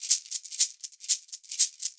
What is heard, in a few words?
drm, 120, MIXED DRUM LOOPS, WORLD DRUMS